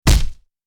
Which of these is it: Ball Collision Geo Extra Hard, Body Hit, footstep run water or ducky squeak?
Body Hit